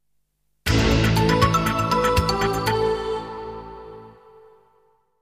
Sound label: Jingles; 90 DDD Jingles